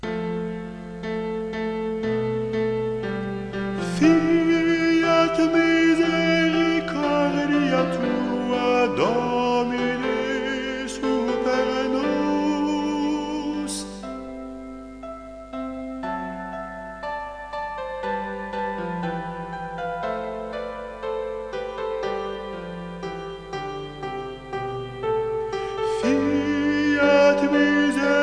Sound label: Te Deum; Charpentier